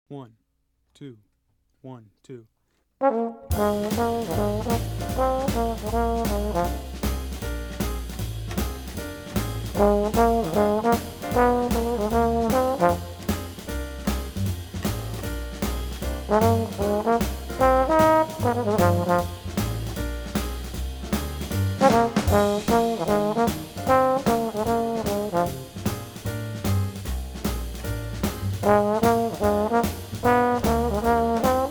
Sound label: Shufflin In F